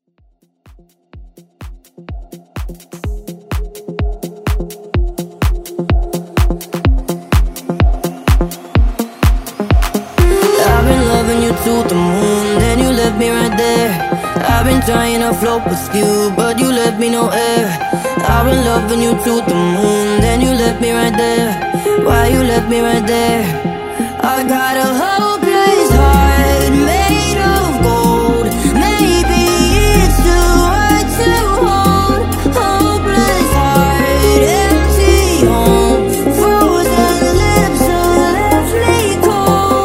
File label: Vocal